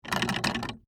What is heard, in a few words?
Door Woodshed, Foley, Door, Woodshed, Latch, Shake, Handle, Metal; Barn Doors; SoundEffects-BlastWave